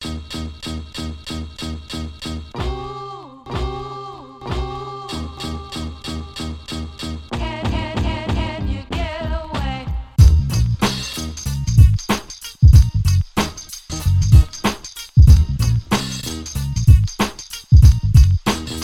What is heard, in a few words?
beat